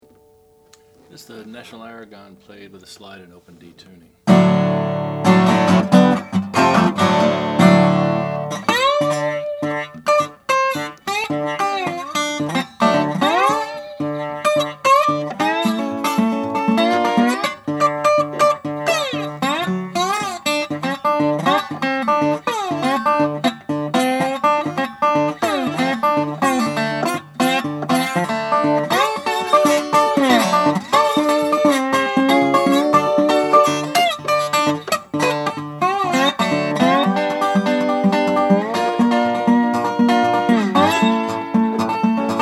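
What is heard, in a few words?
national aragon sunburst, Guitars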